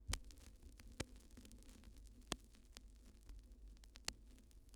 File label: Vinyl Crackles